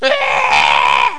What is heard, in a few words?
AHH; KillBill